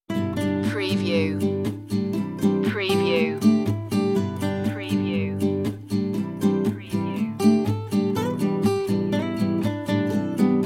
blue eyes guitar

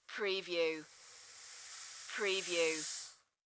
Sand Pour Slow; sound effects bible

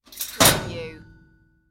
Fireplace Door Close

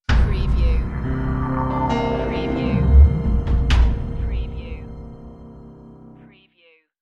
Scifi Audio Logo Ident, Dark Drum, Swell, Curious

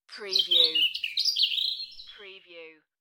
UK Birds; beanstalk audio

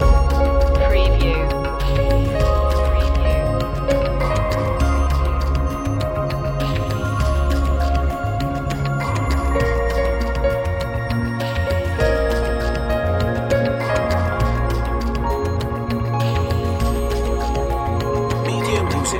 building up loop

